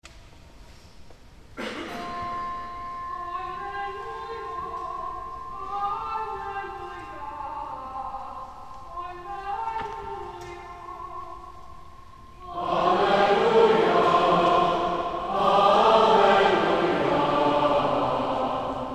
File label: Alleluia, Mode viii